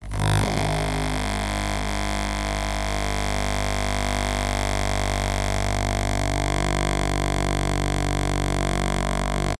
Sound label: plastic cheap thin vibrate fast; foley; zapsplat